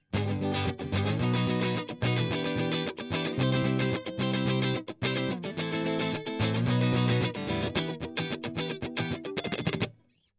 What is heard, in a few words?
Strum